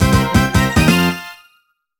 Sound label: Excavation Success